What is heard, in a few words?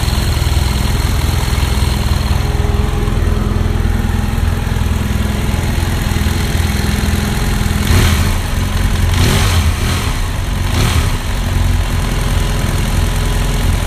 F800GS Racing Sound; F800GS - SR Racing Sound 7